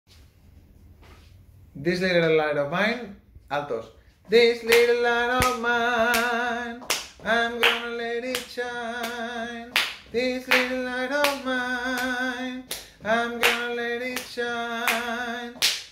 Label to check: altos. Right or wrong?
Right